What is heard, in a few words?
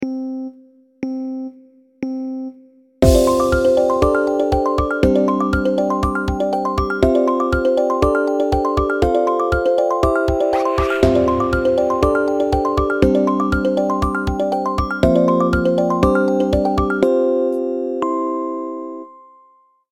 Time Signal Music